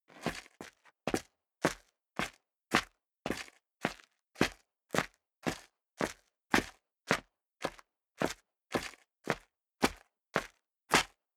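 step dress boots concrete grit upstairs sequence, footsteps; schritte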